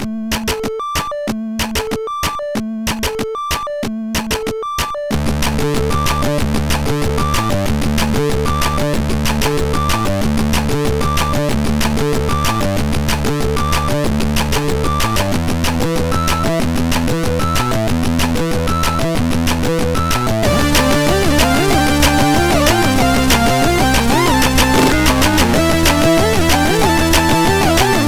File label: Rock, MOS6581R4; Rock IV